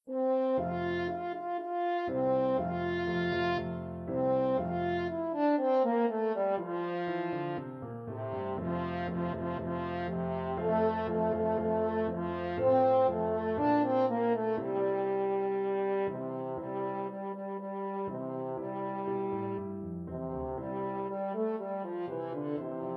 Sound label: french horn